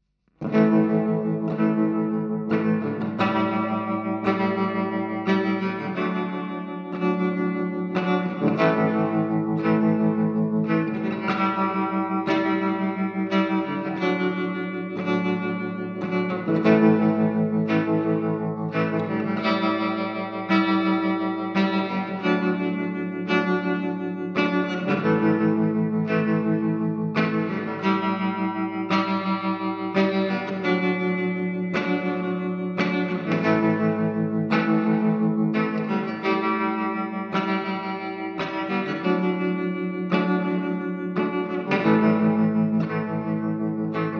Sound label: Guitar